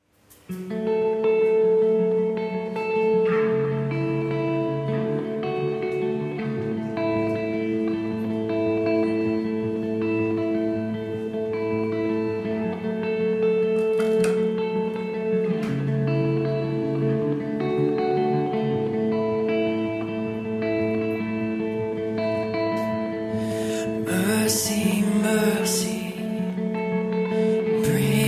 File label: acoustic version